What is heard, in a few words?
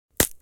Wood crack